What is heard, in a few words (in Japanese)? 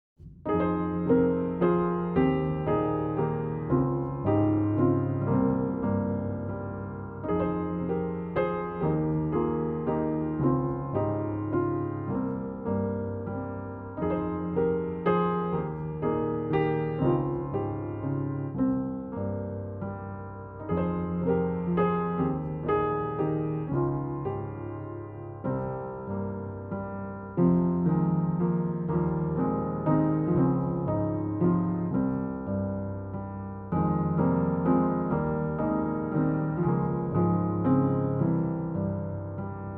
ピアノとまどい; ジングル